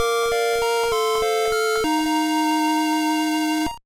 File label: Fanfare; Mother 1+2; GBA